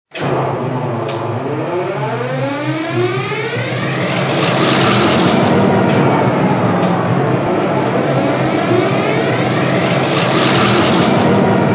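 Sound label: EMD E-8, locomotive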